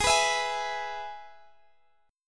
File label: G sharp Minor Sharp 5th, Guitar Open G; x,x,18,16,17,18